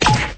chair impact; HeavenStudioPlus; trickClass

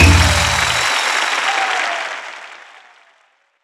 pose; Heaven Studio Plus; sumo Brothers

